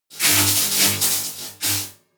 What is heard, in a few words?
futuristic electric spark; comedy-cartoon; elektronisch